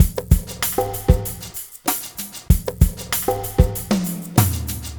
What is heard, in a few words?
drum